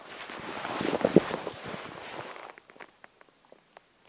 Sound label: other equip, clothing system